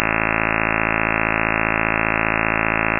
55.125 pseudo impulse

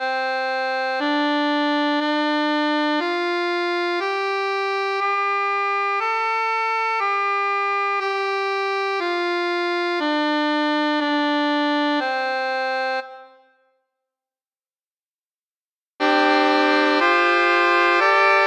below subminor

